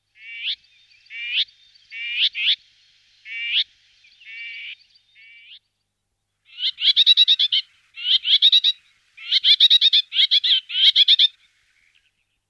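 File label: seraya slavka voice, common whitethroat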